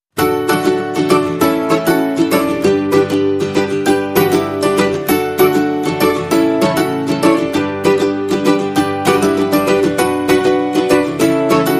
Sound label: Ukulele Guitar and Melody Mix